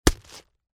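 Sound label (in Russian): удар; Звук ножа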